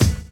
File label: DRUM CHOP; AMG Gota Yashiki Groove Activator